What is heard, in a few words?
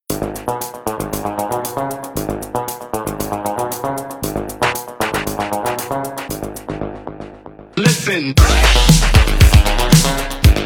Scratch